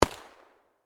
Gun Rifle, Gun, Rifle, Muzzle, Loader, .50, Caliber, Thompson, Center, Distant, Perspective; Warfare; Rifle Sound Effects; BlastWave